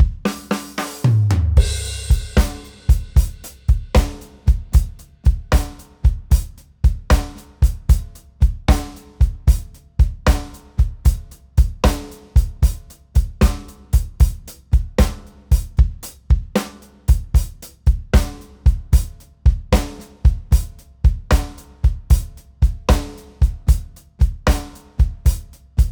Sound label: 12 8 Loop; 12/8 Groove (76 BPM)